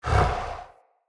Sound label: Camel Idle Breath